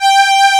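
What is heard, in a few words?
G4, SYNTHESIZER, analog STRINGS; AKAI S6000 CD-ROM - Volume 1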